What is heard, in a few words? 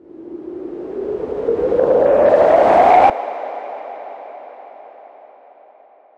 oneshot, FX; GhostHack